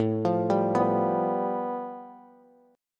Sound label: A 7th Suspended 2nd Sharp 5th, Bass, Bass Standard; A7sus2#5 arpeggiated; JGuitar